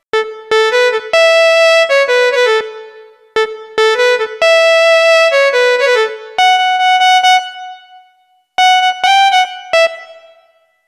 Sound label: Mono Edge